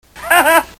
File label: giggle